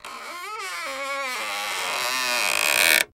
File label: Door Creaking Slowly; hinges creaks